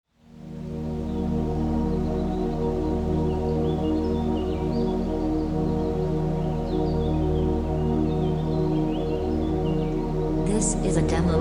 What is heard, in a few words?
Relaxing Music With Birds Sounds